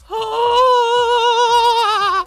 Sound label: ahhhhh; matoro